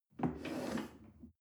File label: chair wood take slide soft